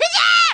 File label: Damage Fly; Poke; Fushigisou